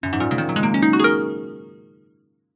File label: fantasy magic wand spell classic harp glissando low pitched fairy; zapsplat